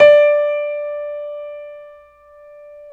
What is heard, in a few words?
PNO, Grand Pno, Roland; Club-50 - Foundations Roland